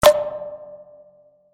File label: Squareshot